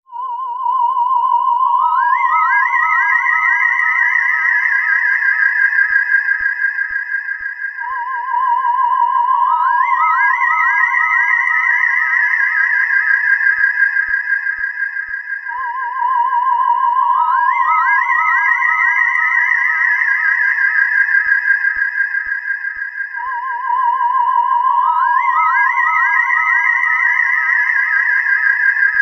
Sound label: Sci-fi Effect